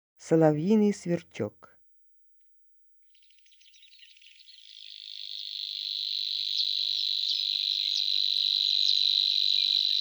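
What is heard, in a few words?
Savi's warbler voice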